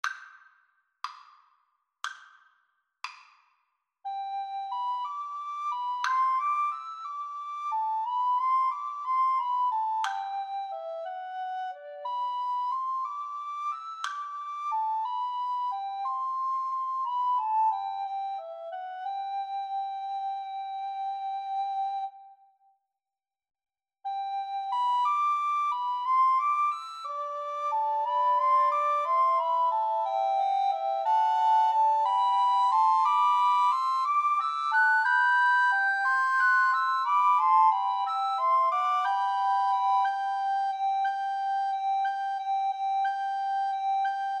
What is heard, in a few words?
Recorder Trio